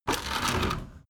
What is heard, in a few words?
window roller shutter open soft single pull